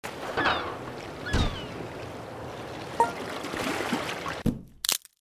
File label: Docks